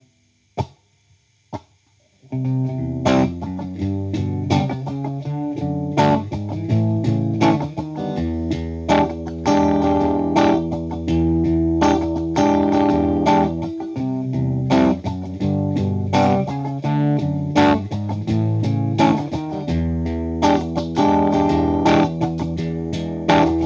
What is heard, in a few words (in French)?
complet basse